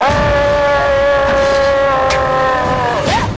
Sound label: ahhhhh